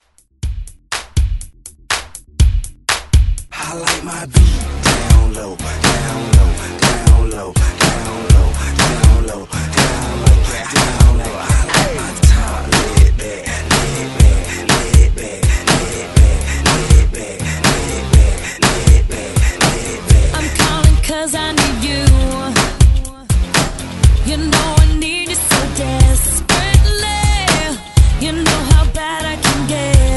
Hype ReDrum 122Bpm; DJDOLLS

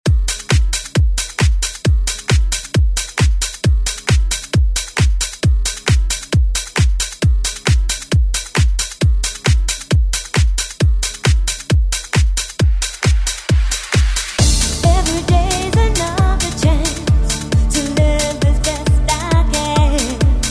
90s ReDrum; DJ Mhark

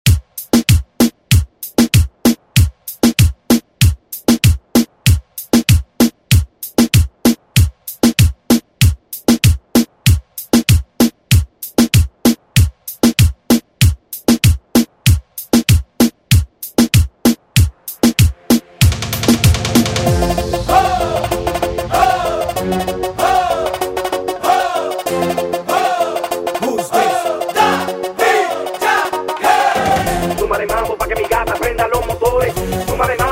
Reggaeton Redrum

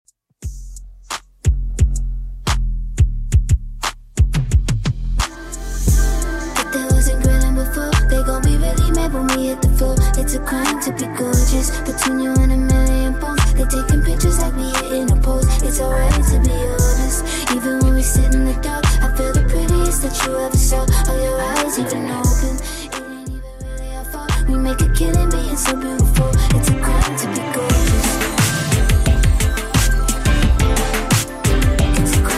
Top40 Redrum 88bpm

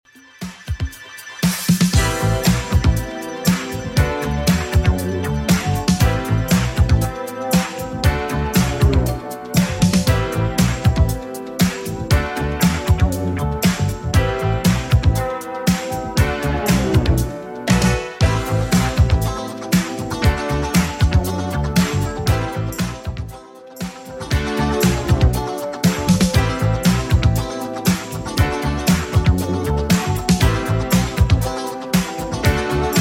80s Redrum 118bpm

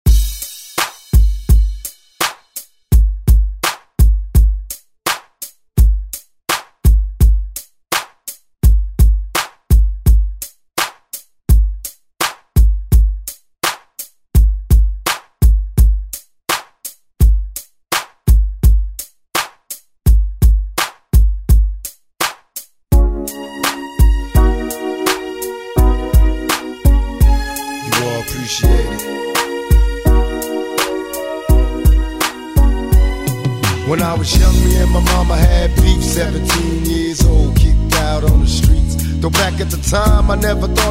90s ReDrum; DJ Mhark

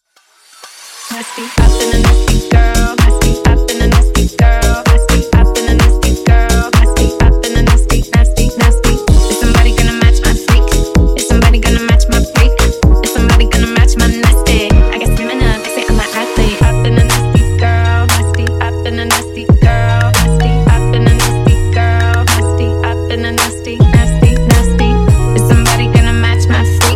Funk Transition 128 104Bpm

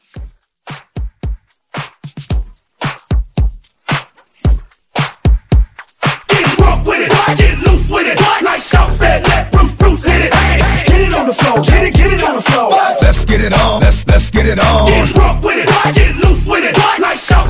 Hype Edit 112

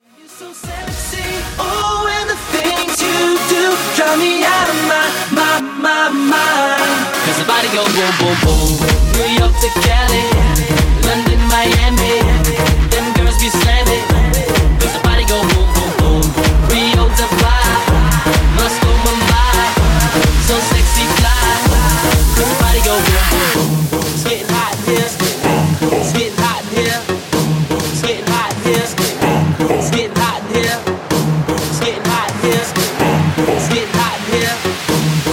WordPlay Segue 127Bpm Clean

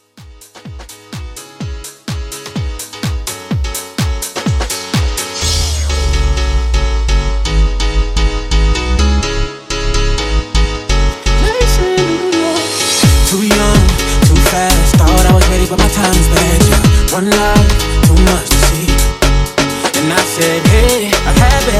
Crazy Mashup 126

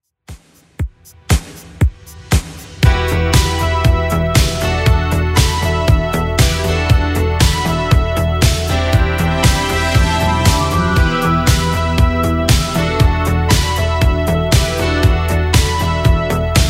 80s Redrum 118bpm

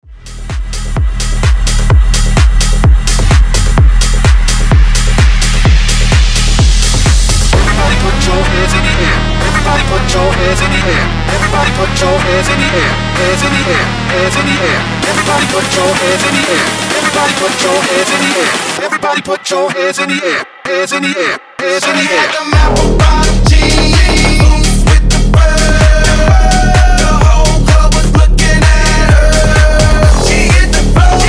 tech house breaker, 128 bpm

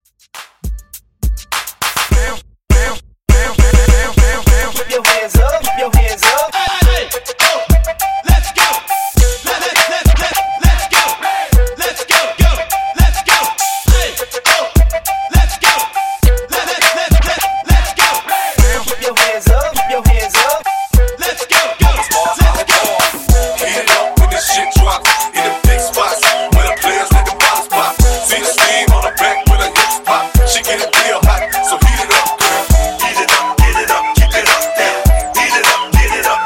Break 102BPM

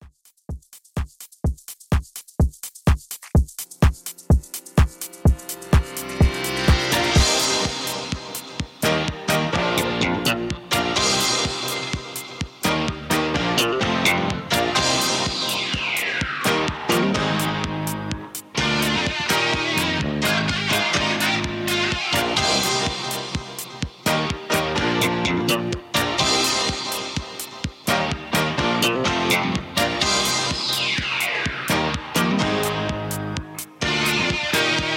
80s Funk Redrum 126Bpm

